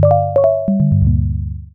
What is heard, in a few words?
Battery Critical; Emberfall